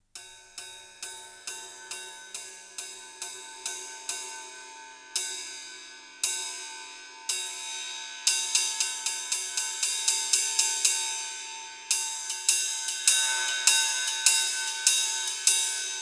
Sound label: ride sweeping